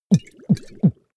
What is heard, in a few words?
potion small drink